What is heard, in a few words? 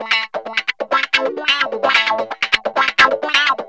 GUITARS 130, House